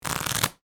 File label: shuffle